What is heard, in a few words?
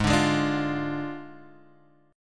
D Diminished, chord